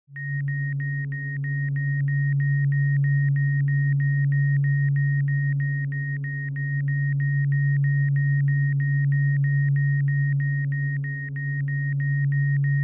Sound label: loading